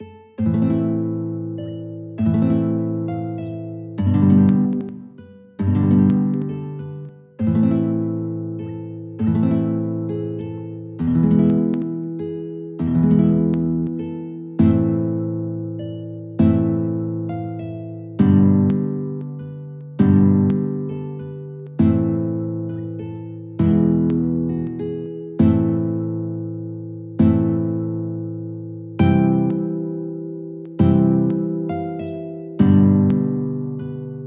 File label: mandolin guitar duet